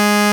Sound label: P6 PLS; INIS; Vintage